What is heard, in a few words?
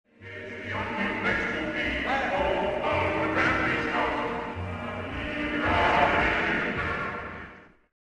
cartoon cat breath